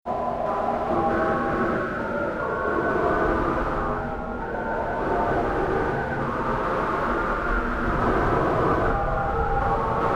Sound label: sirens